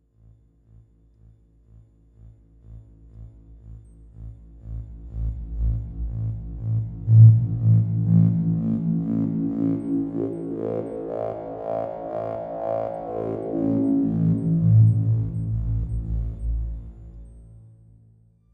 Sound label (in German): filterspiel, Trautonium